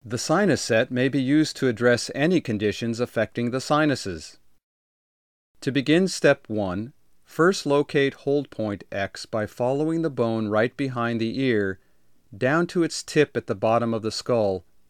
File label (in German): Sinus